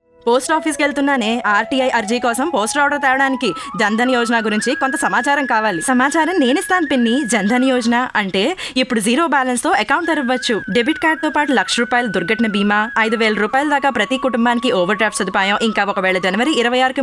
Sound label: Telugu, anthem